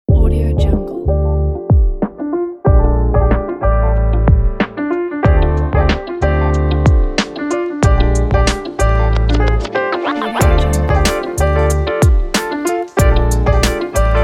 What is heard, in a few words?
jazz hip hop